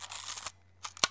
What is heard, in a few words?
Camera